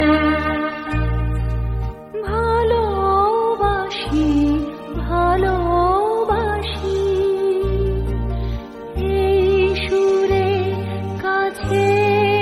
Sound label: bengali ring ringtone; bollywood